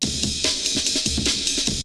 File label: BREAKBEATS, TECHNO